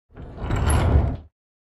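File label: Dresser Rolled On Wood, scrapes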